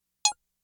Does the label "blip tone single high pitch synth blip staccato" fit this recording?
Yes